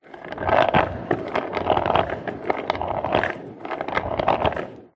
industrial dolly trolley wood small on wheels short roll on wood; zapsplat